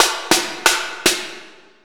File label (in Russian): Shorokh